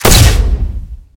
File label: Translocator Fire, weapons; Requests Studio; UT3